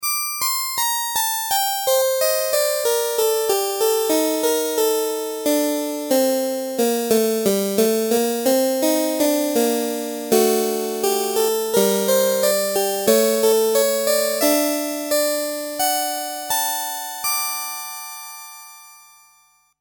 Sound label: elka rhapsody 610 piano clavichord